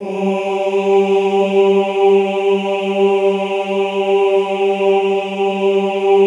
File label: OOH G2, Classical Choir; Best Service; Extended Classical Choir; OOH MIX